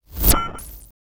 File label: UI Notification; Cielonos; Wwise